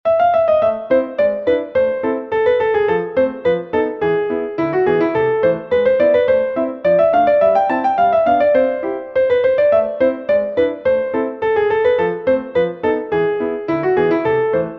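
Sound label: Gavotte in A minor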